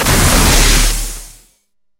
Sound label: portal spawn; Sfx; freepixel; Tilter